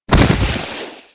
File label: body drop slide; Physics